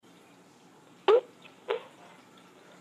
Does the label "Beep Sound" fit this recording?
Yes